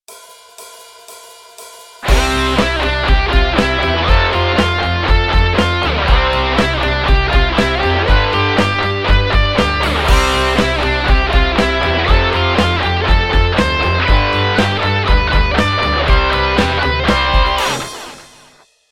Drum Kit Full Throttle

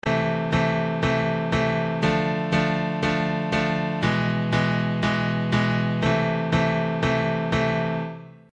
Am Dm E Am

